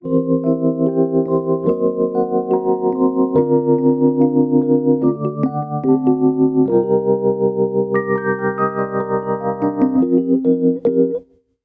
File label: rhodes